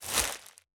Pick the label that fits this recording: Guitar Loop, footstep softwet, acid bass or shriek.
footstep softwet